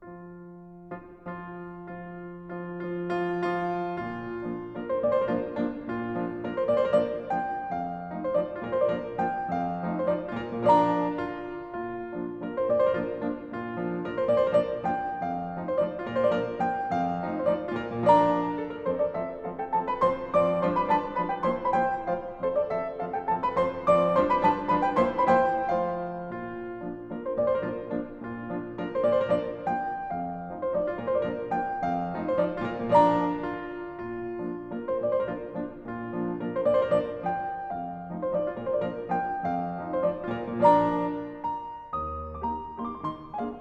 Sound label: Mazurka In B Flat Major, Piano; Chopin